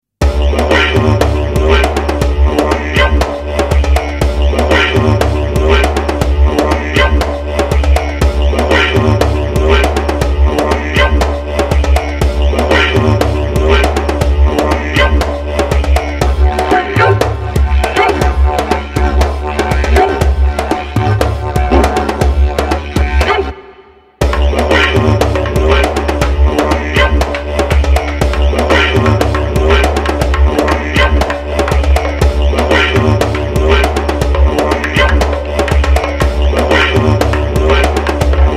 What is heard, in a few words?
australian didgeridoo